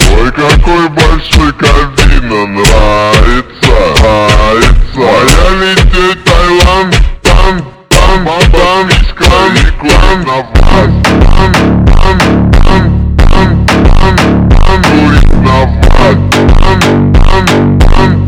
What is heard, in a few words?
funk ultra slowed